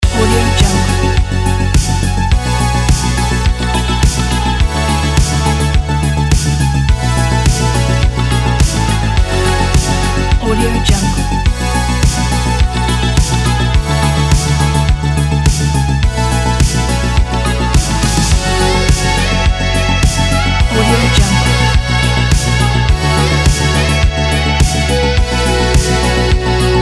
saxophone sax synthwave

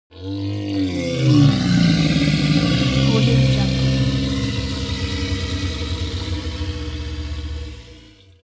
creature golem growl domination

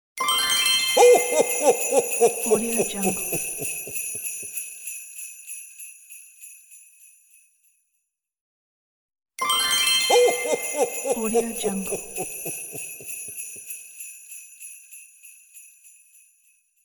santa laughing

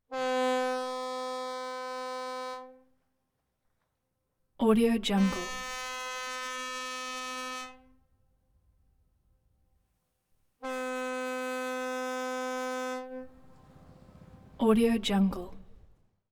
close ferry boat horn blasts; hollywoodedge

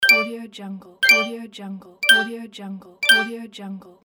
pick coin

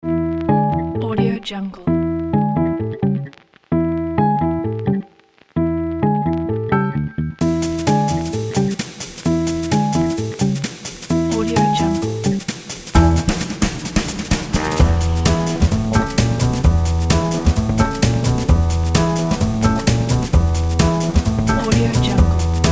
retro funky jazz opener

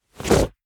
whoosh cloth leather fight

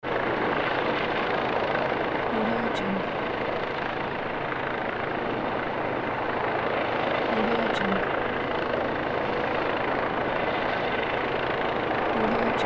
helicopter flying away; aetherfx

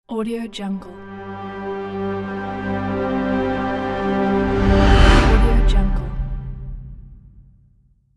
cinematic logo reveal

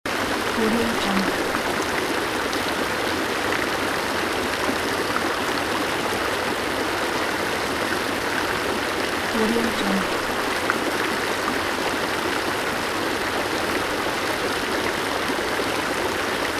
river

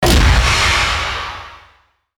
space cannon shot

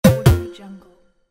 game soft fail sound

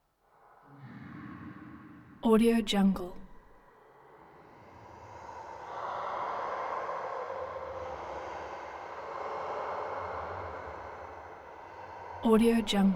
throat breathing eerie nightmarish wind screams

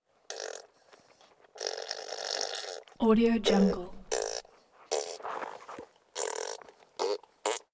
vocal experiments blech mouth farts spit quack

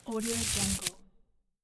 nylon rope slide

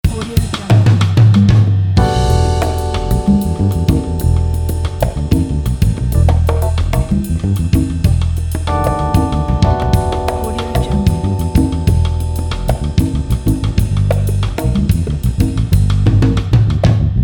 jazzy

